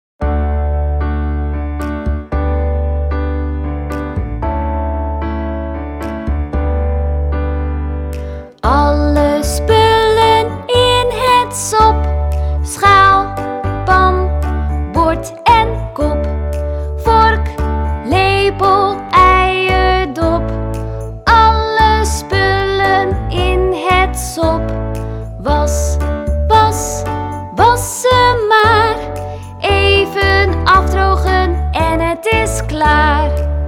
Het afwaslied; taalsprong

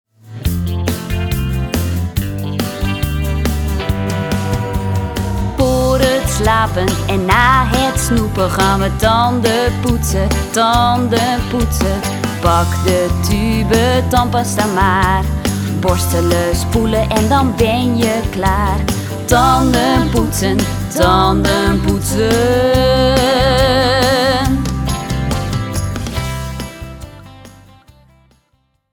Tanden poetsen lied; taalsprong

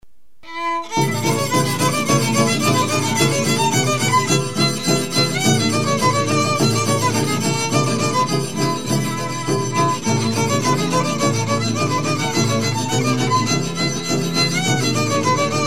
viool danza

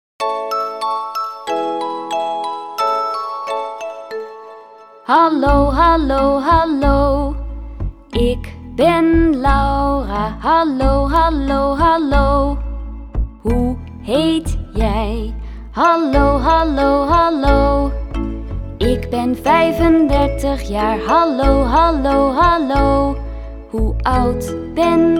Hallo hallo hallo begeleiding met zang; taalsprong

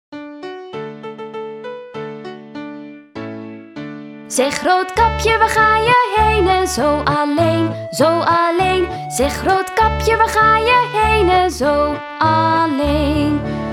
lied; Roodkapje; taalsprong; er was eens

